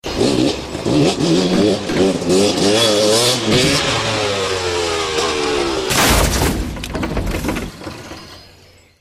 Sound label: Motocross Crash